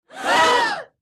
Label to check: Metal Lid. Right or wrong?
Wrong